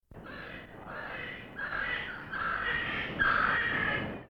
pternistis afer, birds calls; phasianidae

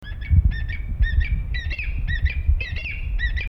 scleroptila shelleyi, birds calls; phasianidae

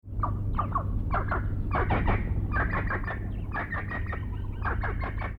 pternistis natalensis advert, birds calls; phasianidae

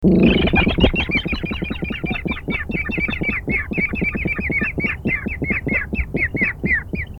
rallus caerulescens, birds calls; rallidae

